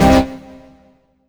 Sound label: STAB Lost in Rain; Polyend; Plughugger